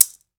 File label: Latin Shakers, mexican